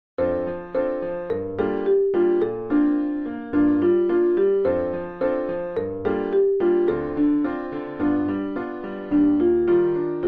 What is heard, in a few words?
marimba